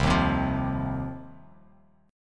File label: C Minor Double Flat 5th C down Bass Standard; Cmbb5 strummed; JGuitar